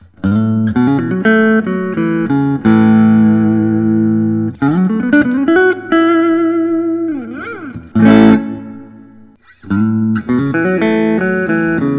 Ronin Mirari; two tone sunburst

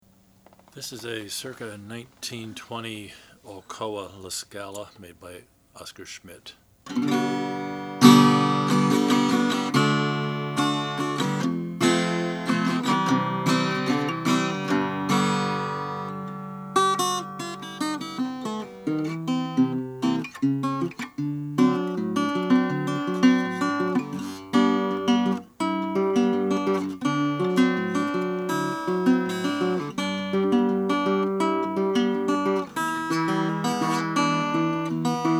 oscar schmidt la scala koa

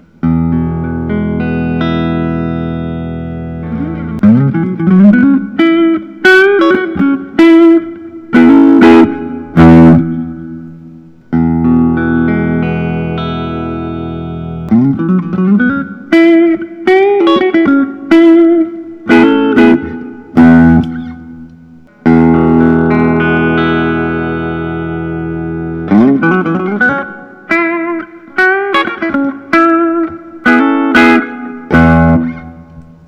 gibson custom 58 les paul reissue; red

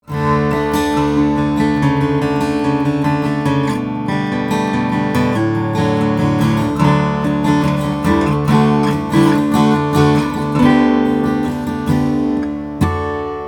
keystone sj fiddleback mahogany

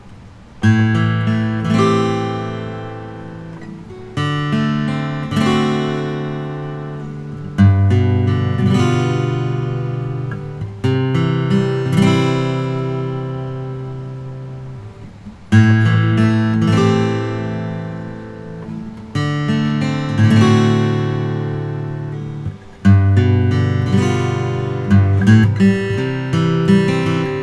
Collings OM2 German wenge

